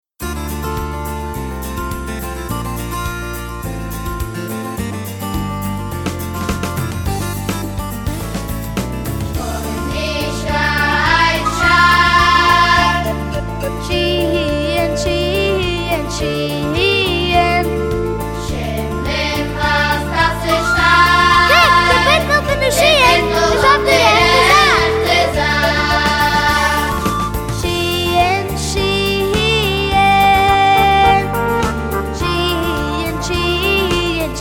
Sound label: niggun